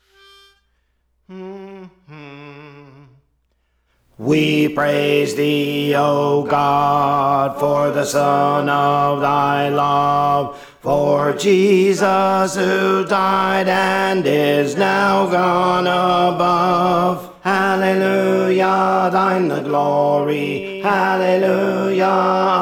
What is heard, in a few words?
Alto; Praise For The Lord Training